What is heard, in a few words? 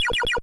dig; C64; LodeRunner TotalRecall